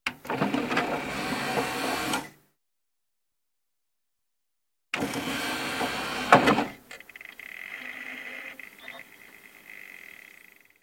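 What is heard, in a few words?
sounds of CD player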